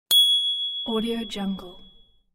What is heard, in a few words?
ding sound high pitched